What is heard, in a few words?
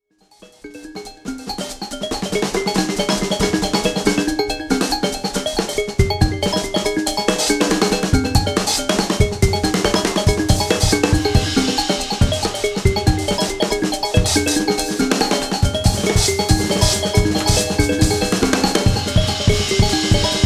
Performance Segment